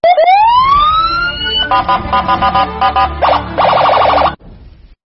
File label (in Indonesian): nada notifikasi sirine polis